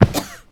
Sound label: Person Knocked Down